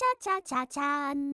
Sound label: windows xp shutdown; miku